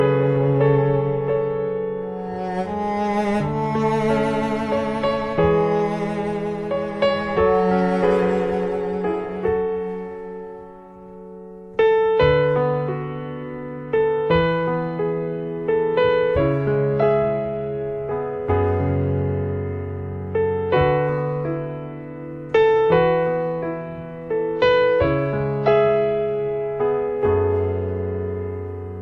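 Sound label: Violin And Piano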